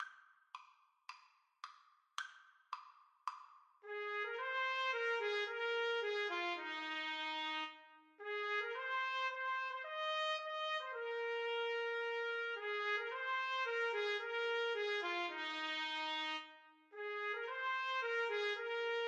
Trumpet Duet

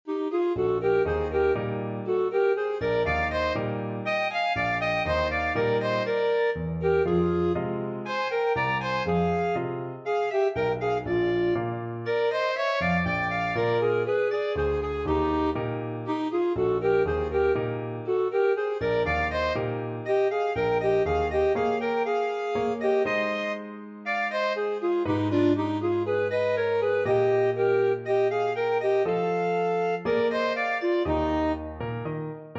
clarinet saxophone duet

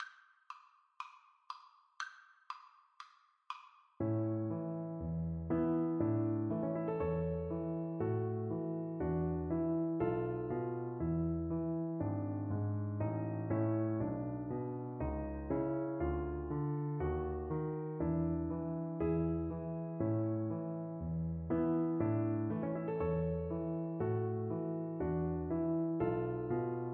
reed pipes, violin

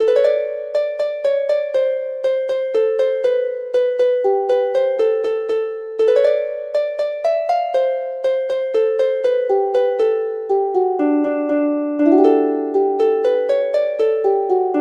harp; Turlough O Carolan

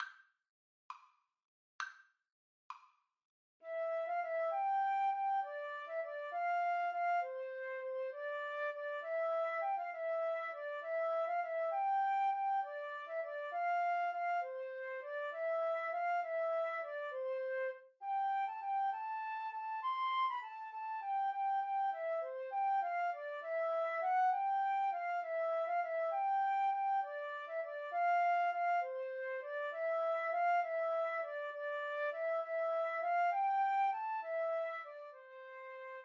Flute Duet

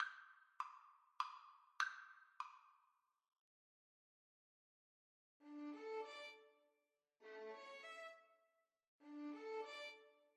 Violin Duet

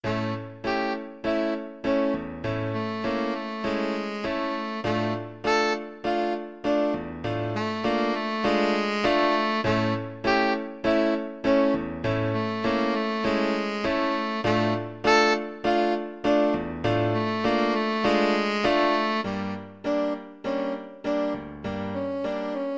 tenor saxophone

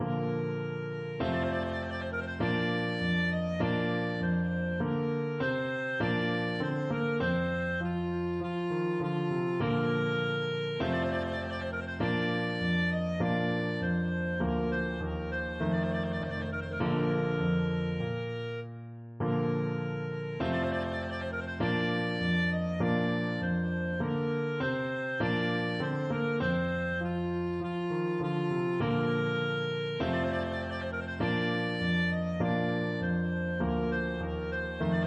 trumpet voluntary, clarinet

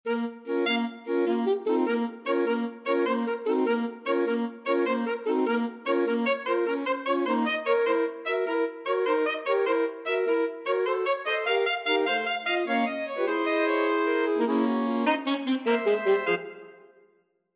sorcerer apprentice, alto sax quartet

